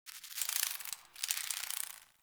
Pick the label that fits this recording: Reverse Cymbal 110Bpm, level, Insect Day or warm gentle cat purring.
Insect Day